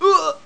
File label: DIE